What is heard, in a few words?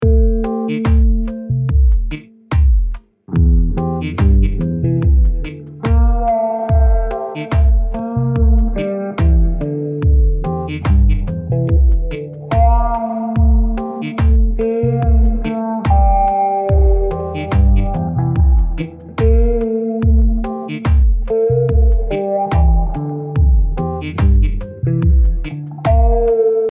slo funk cue